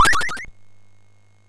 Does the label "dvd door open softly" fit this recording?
No